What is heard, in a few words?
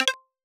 Video Record; android-7.0.0 r1